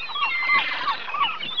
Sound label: ocean exclam; 250 Desktop Themes